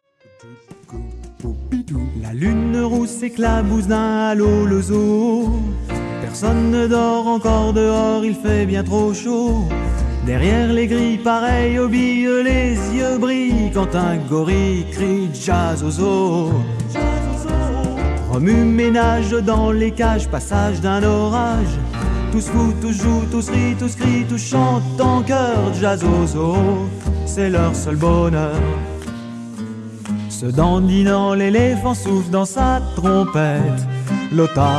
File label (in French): Jazz